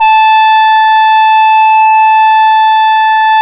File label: REED A3, Smoov Leadz; E-MU Producer Series Vol. 6 – Analogia Project; Strictly Synthe